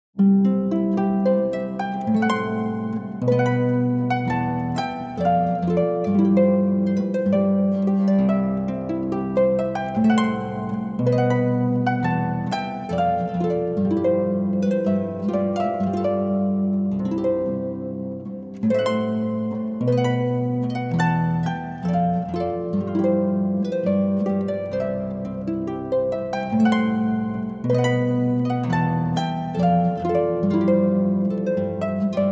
Wedding March, harp

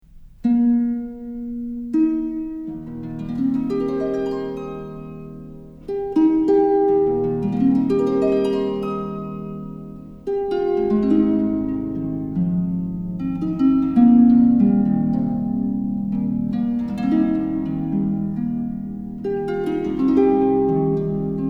harp; Amazing Grace

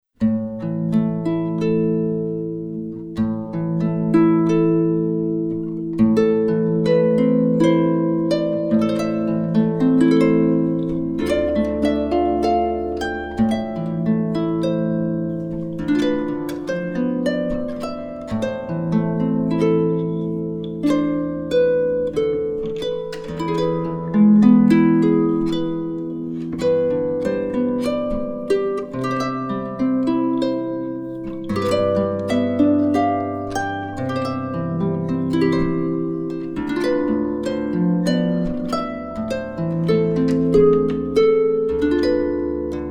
brazilian lullaby, harp

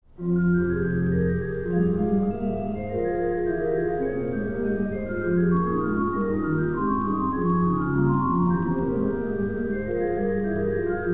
organ; Wo Soll Ich Fliehen Hin; organ masterpieces cd

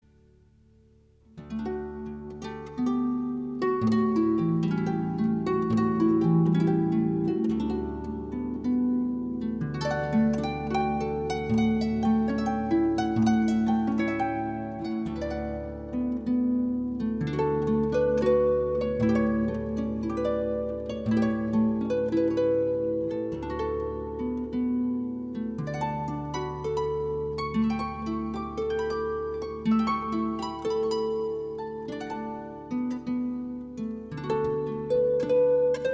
Barcarolle, harp

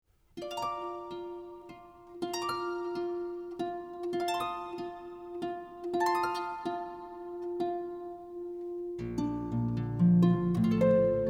Edelweiss

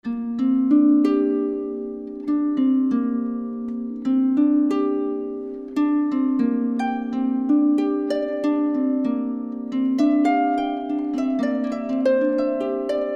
harp; Reverie

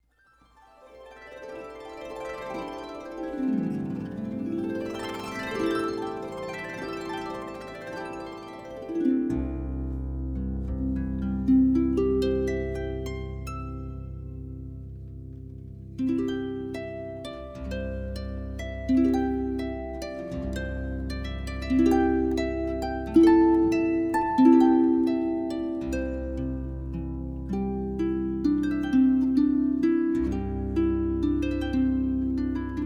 harp; Morning

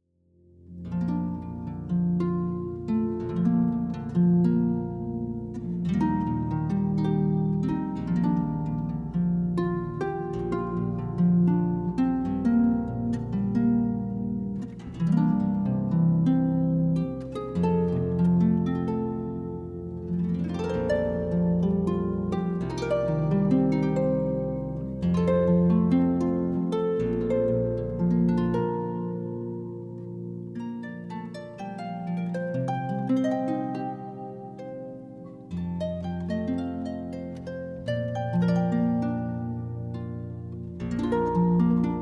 harp

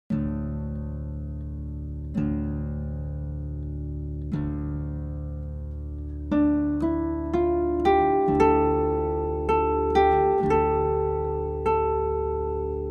Mortal Flesh Keep Silence, harp

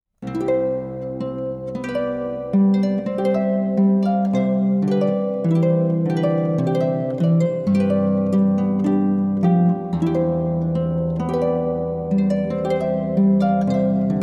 Trumpet Voluntary, harp

